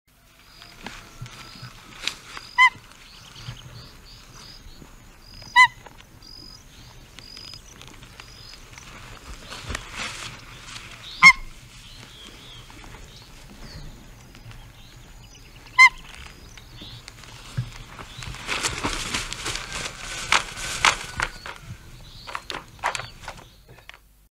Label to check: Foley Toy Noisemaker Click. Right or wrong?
Wrong